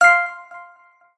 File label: Sfx Basketball Fever